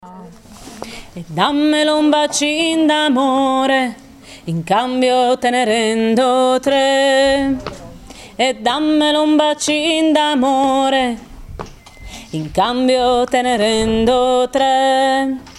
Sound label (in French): refrain voix